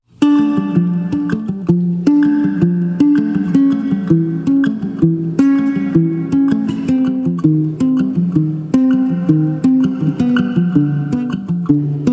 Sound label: Arpeggio Bari; Poetry Project